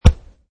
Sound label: Chest Punch